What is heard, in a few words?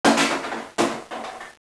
trash